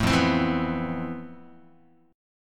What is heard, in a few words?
F Major Sharp 11th, Guitar; FM#11; 0,1,3,2,3,x; 4A,4E,5A,5D,5G#,6C#; strummed